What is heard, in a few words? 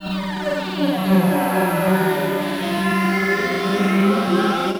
Mutant Choir, Vocal; Sonic Foundry (Sony Creative Software); Myths of Technology